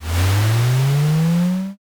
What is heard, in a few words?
rocket launch